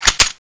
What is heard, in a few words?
bolt pull; weapons; vulcanus5